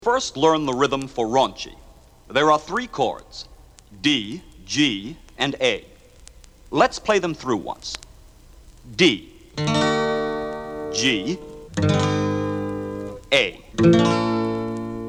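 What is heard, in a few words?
rhythm, guitar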